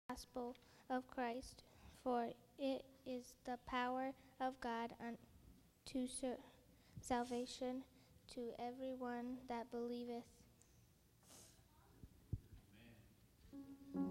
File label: youth choir